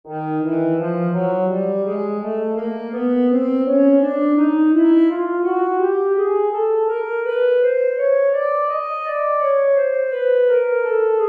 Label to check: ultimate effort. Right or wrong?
Wrong